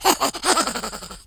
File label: laugh; Confucious